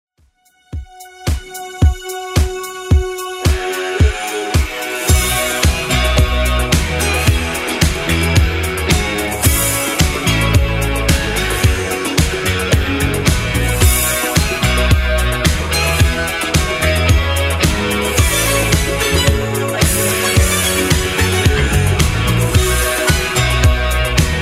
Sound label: 80s 110bpm; Redrum